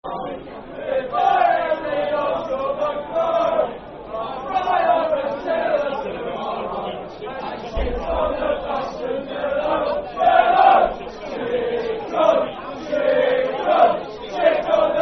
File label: If I Had The Wings Of A Sparrow, Football Chant (Soccer Song); Brighton Bhafc